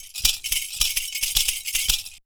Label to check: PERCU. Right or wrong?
Right